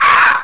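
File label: HAWK hurt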